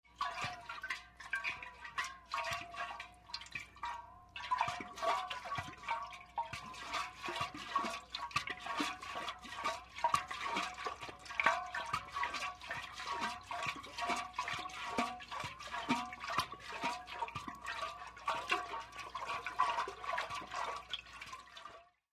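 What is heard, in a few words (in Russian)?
плеск воды в металлической бочке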